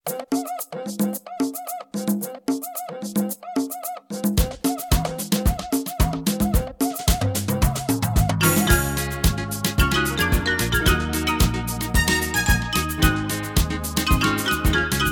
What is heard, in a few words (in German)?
Samba, One-Touch-Preset mit VSP-Style; VSP-1; KEYBITS; Von der früheren VSP live auf Keybits oder 450 CT eingespielt (mit OPERA 2000 V6)!